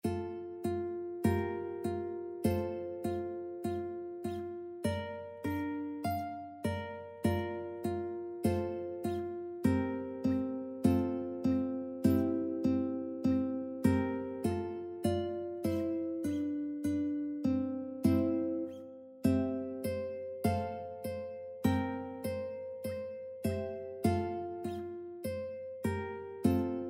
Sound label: uke serenade; ukebook; turk